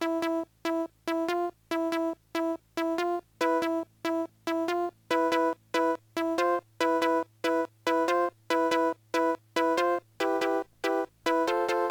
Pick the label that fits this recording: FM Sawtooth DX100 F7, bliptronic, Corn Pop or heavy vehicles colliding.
bliptronic